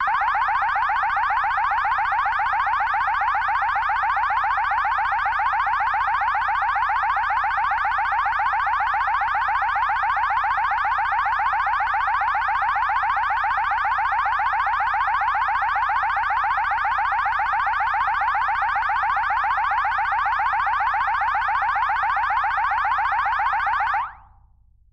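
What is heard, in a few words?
alarm sounds; zvuki signalizacii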